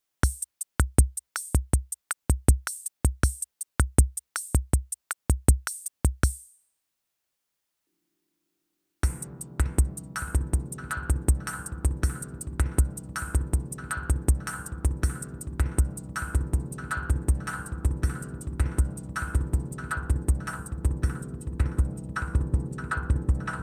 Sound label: drums